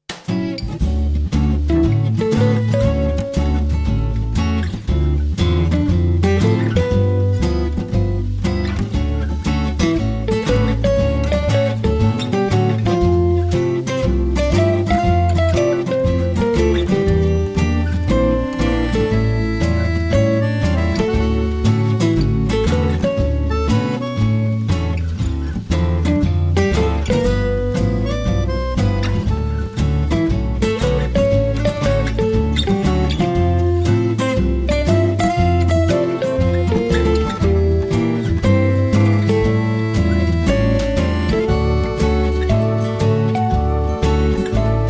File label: BALLADE SWING; Musique de la publicité Lancôme, Agence : Publicis